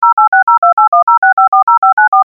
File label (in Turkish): telefon